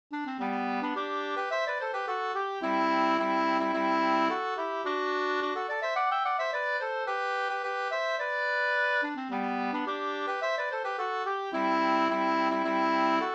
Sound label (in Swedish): ganglat; Min hembygd av A Soderqvist Mangskog Vr